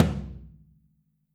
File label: FLR TOM OH; Q Up Arts; Denny Jaeger Private Collection